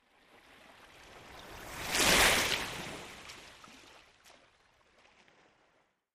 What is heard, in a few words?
Surf Water Lap Sml, waves surf